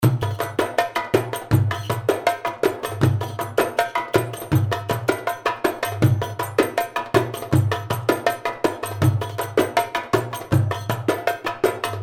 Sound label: rai; afrique; nordouest